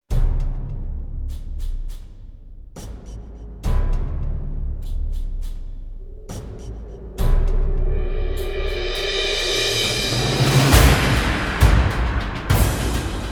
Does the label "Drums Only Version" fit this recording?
Yes